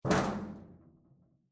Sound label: Metal Footfall; soundscrate